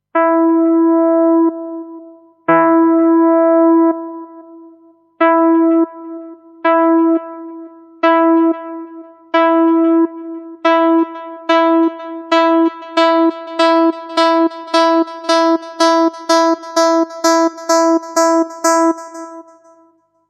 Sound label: FM Syn Lead OP3 Coarse; 1 05 bis 20